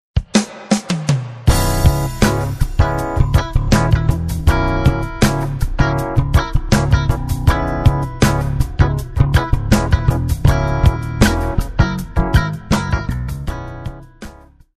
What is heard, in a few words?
Drums Groove; Drums Vol.1